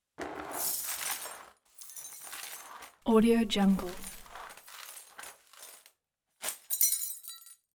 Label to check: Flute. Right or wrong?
Wrong